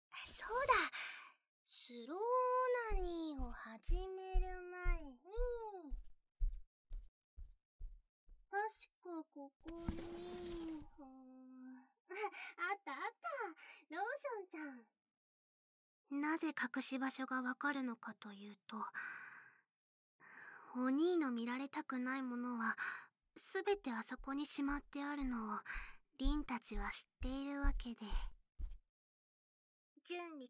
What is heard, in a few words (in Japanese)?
ローション と 耳舐め 愛撫; ふたご妹のささやき☆スローオナニー; ローションと耳舐め愛撫で高めていこうね